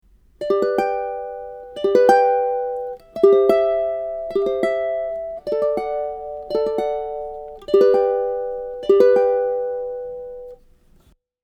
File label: Polychords Solo, ukulele